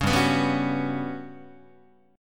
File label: G Major 7th Flat 5th, Guitar; Listen to GM7b5 strummed; GM7b5 Chord